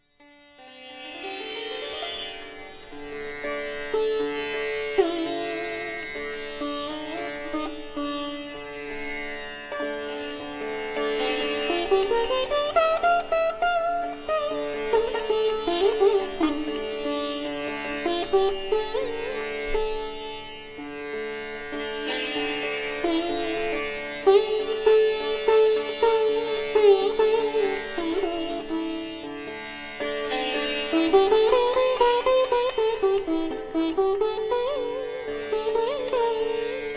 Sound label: sitar; Indai